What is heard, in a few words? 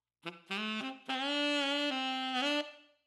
Sax Pop 112bpm; F Major; D Minor; C Major; Eb Major; Bb Major